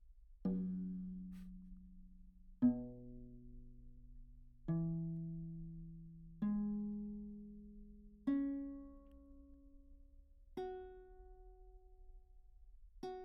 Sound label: tenor viol pizz, Anechoic; OPENAIR